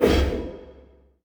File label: tower arborean emissary basic attack